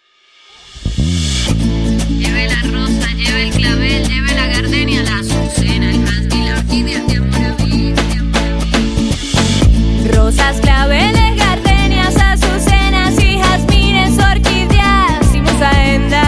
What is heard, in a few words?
okarina; sol